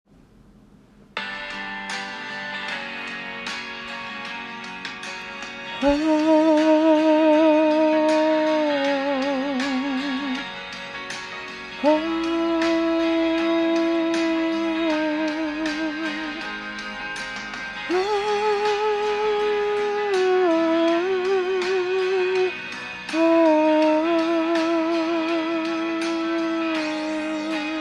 vocalises

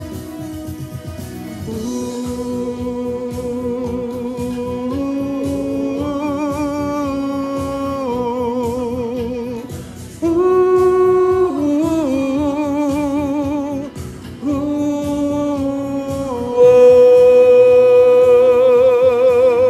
vocalises; Vocalises sur pont musical

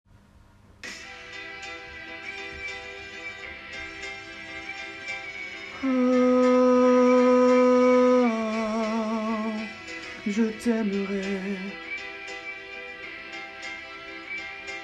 harmo fin fin

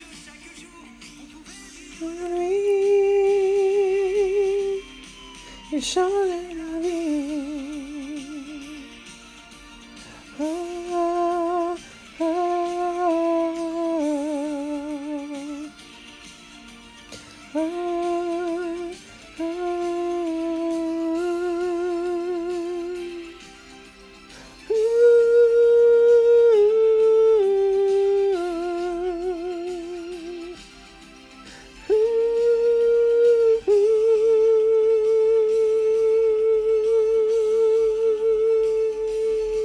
vocalises , Vocalises sur pont musical

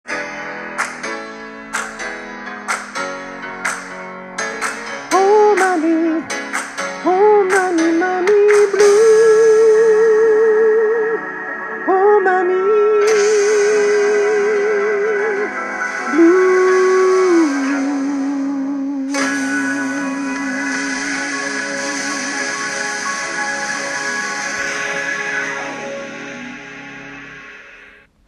fin

